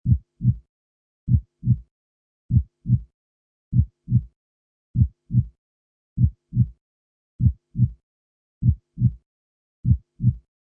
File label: Heartbeat Several, Close, stereo imaged sound; Heartbeat: Close, stereo imaged sound of beating heart. Atmospheric. Stethoscope. Continuous 30 seconds